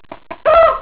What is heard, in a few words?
chook; animals